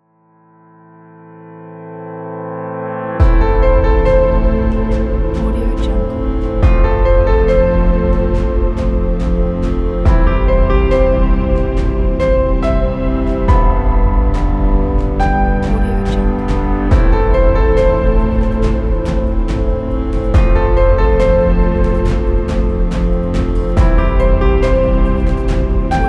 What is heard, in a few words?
inspiring epic cinematic teaser